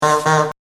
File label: CIRCUS